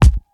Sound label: smooth steel kick drum G key